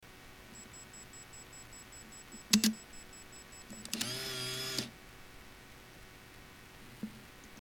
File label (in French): clicclac